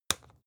spice jar smack open short; industrie wirtschaft; gastronomie

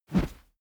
whoosh heavy low; swooshes-swishes

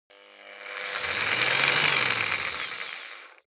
jigsaw engine slow short; maschinen; saege

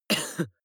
cough male single soft short; soundpool

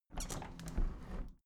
window handle plastic squeak close soft; objekte; fenster

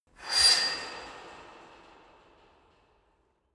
metal squeak rattle; metall

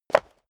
step high heels concrete jump; schritte; Footsteps

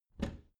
bathroom cabinet doors open soft; objekte; tueren

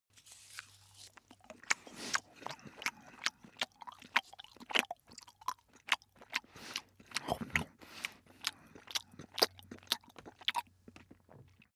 dog eat breathe long; natur-tiere; hunde

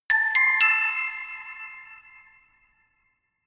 notification glockenspiel creepy triad up minor

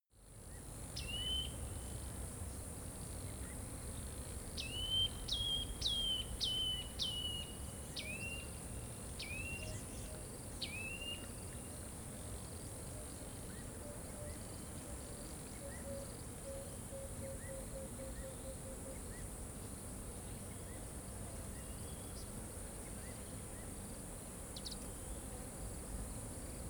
river day birds, dschungel regenwald; atmosphaeren umwelt

